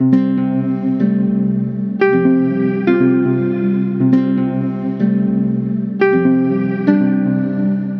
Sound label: Musical Loop 120 C Major; Anticipate; Ghosthack